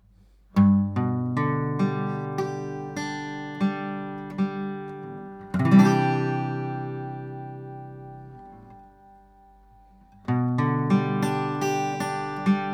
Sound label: AEA R44C Guitar; AEA TRP3